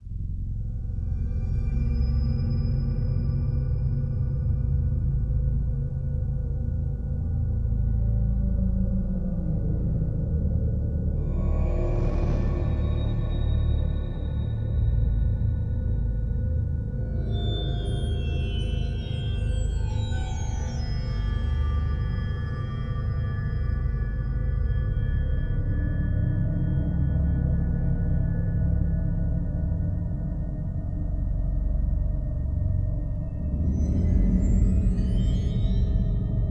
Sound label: DLC1 Ambient D